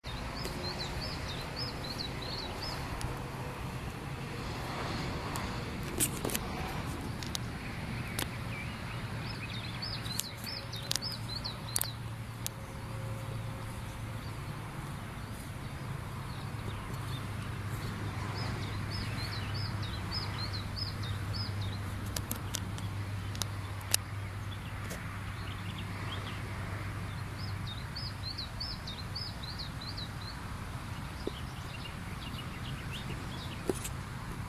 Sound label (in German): taigazilpzalp